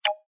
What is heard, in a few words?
standard Button; slots; Sushi Suprise